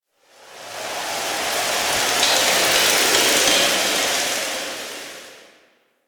Tower Rustling Branch; Ambience; Warframe; Quests; The New War; Cetus; Unum Tower

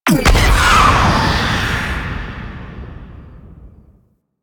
Ghost Ship Missile Barrage; Warframe; Quests; Wraith Quest

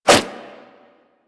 Nunchuck Whoosh; Warframe; Infested

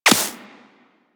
Laser Impact Flesh; Warframe; Weapons

